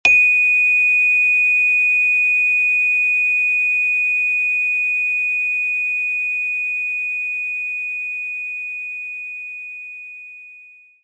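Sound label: Celeste D sharp 7 delay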